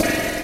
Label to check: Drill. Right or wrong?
Right